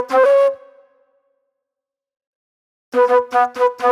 Movie Flute; Sonic Foundry (Sony Creative Software); Manhattan Flutes; Super Brown

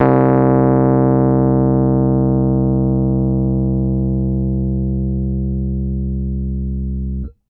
Piano Rhodes c1 hard; TrashLibrary

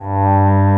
PA, ANA PAD

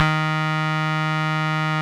Roxichord, E.Piano

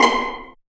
Pluck; Zero-G Jungle Warfare 1, 2 & 3

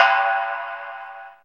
METAL, METAL PERC; Zero-G Ambient 1

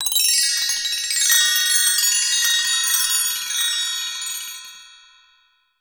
CHINESE, BELLS CHIMES; Voice Crystal - Steve Reid Definitive Percussion

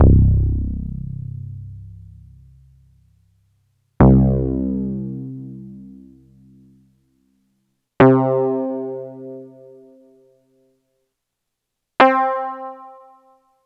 ELEC BASS, Synths

